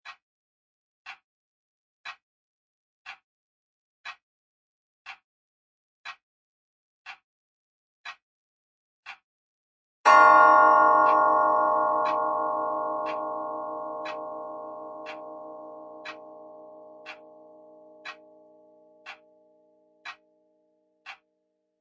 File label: Grandfather Clock Tick Tock Hour Chime; Clock Sound Effects; Machines